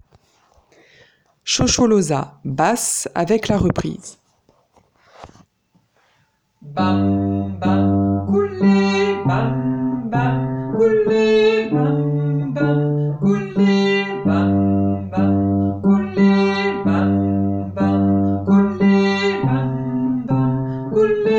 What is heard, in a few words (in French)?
Shosholoza basse; Traditionnel Sud Africain